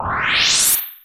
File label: Teleport; Mega Man X